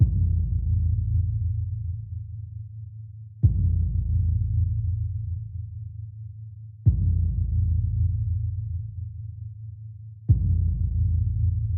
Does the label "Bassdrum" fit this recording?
Yes